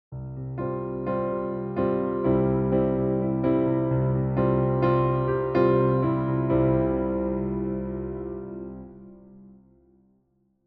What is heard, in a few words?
pearl wonky release vintage d comparison